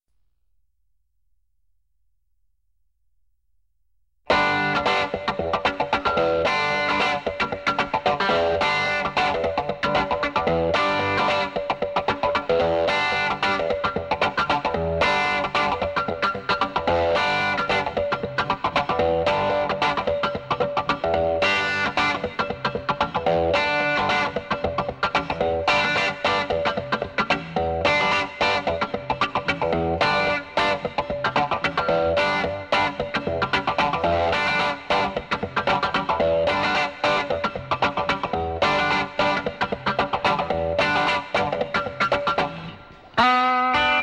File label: guitar